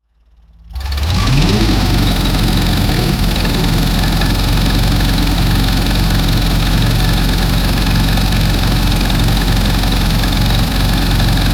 two naturally aspirated, race car

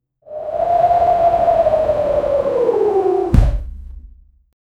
sudden rising whoosh sound; air

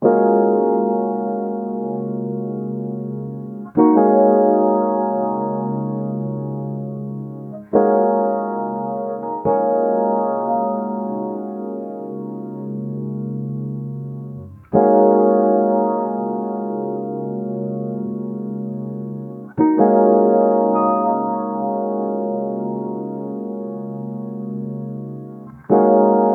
warm melancholic lofi jaz, electric piano

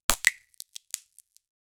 a toxic soda candy energy, candy

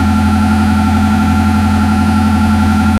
looping sound of a car, engine

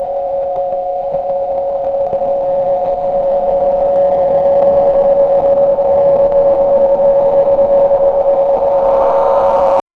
gentle pulsing digital to

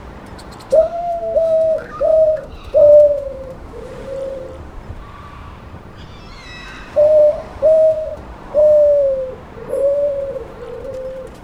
crazy pigeon in the city, cawing

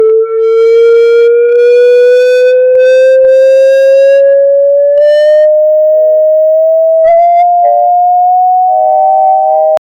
ambulance, emergency vehicle

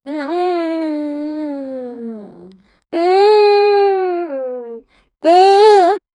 mature female bonobo want; animal behavior

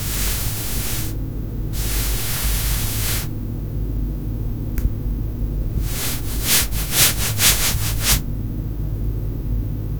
asmr cotton dabbing on

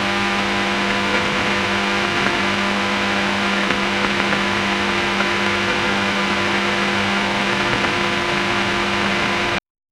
vintage radio static loop

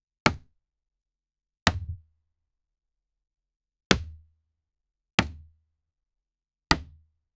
big impact of a wooden, baseball

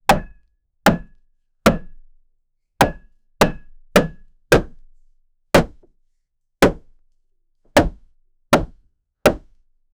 woodworking

